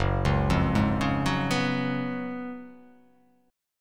Gb Major 7th Suspended 2nd Suspended 4th Gb down, GbM7sus2sus4 chord; GbM7sus2sus4 arpeggiated; JGuitar